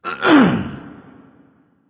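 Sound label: nonverbal clear throat; smite; voicepack; ullr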